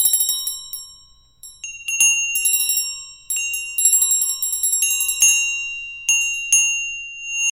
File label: Swell Bells